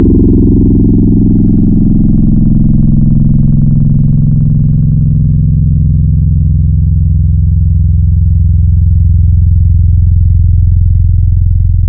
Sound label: FM Wave; Synthetic's Serum Collection; Chill Trap 2 Tables